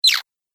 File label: Cartoon Gremlin Chirp; Cartoon Voice Sound Effects